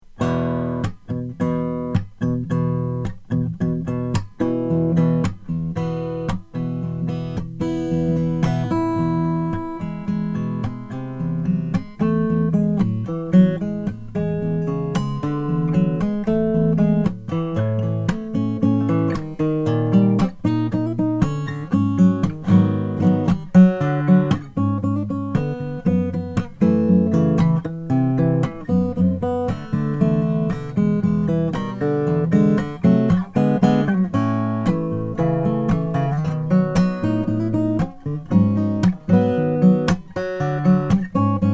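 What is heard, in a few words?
mcpherson mg 3 5 ebony, guitars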